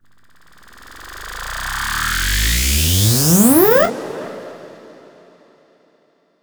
Uplifter

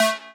Synth Sound; Vengeance Samples; VEE Synth Shots

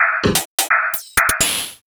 VFX2 Loop 128 BPM

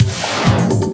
Fill Ins 128BPM; Vengeance Samples

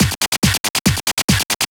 Loop 140 BPM; vengeance essential club sounds-3

